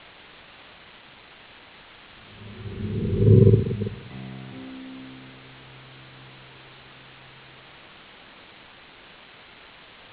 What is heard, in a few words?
tornplace theme; hundershade tornplace